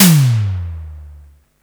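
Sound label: Simmons tom, SDS 8 Tom (Long)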